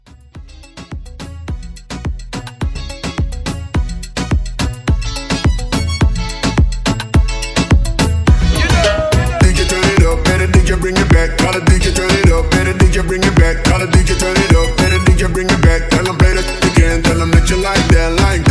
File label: Moomba 106; Moomba Hype Edit